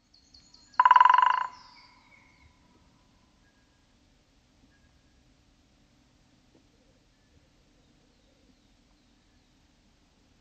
kapytikka; Kutsu- ja varoitusääni kuuluva ”gyk”. Lyhyt ja nopea rummutus kuuluu kauas; birdlife

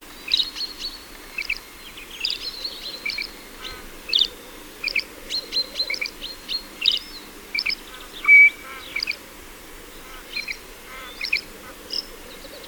isolepinkainen; Tero Linjama

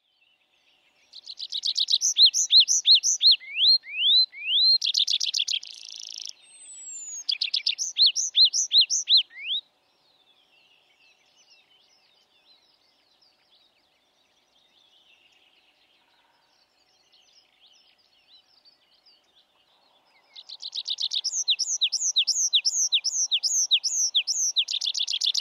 metsäkirvinen; Kutsuääni sirahtava ”bsri”; birdlife

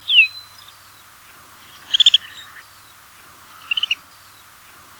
pulmunen; Tero Linjama

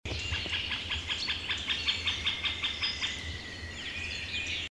tammitikka; Tammitikan kutsuääni toistuu usein sarjana, ja sitä kuvataan käpytikan ääntä matalammaksi ja pehmeämmäksi; Maaliskuu 2008, Unkari; Jarmo Pirhonen

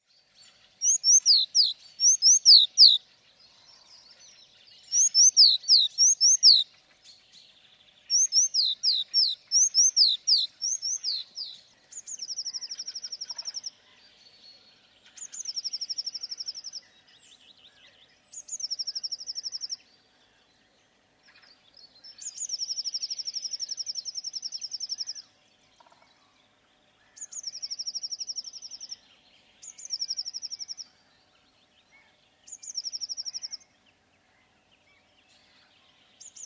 sinitiainen; Kevätlaulu korkea heleä ”tii-tii-tililililili”, kutsuäänenä muun muassa ”tsäsäsäsä”

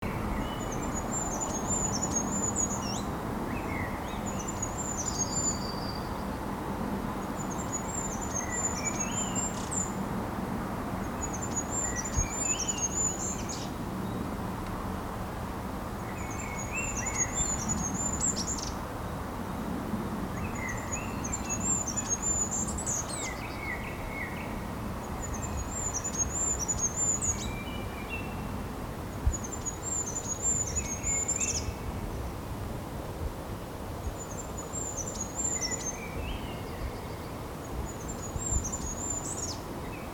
Hippiainen laulu; hippiäisen laulua; Kirkkonummi 28.3.2016; Eetu Paljakka

